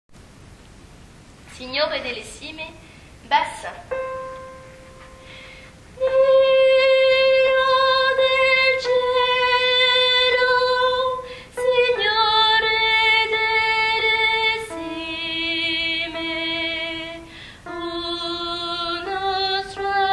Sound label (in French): BASSE